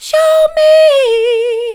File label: show me soft, vocal